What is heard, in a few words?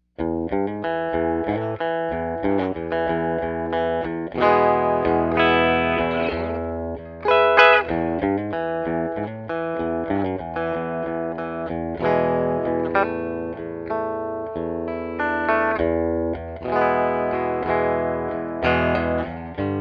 Bridge Clean